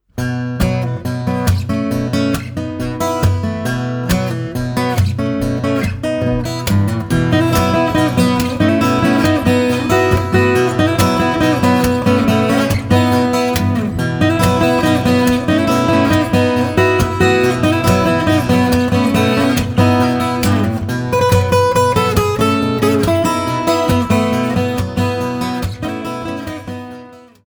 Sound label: stomp